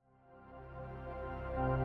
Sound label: Special Photo Old OS Place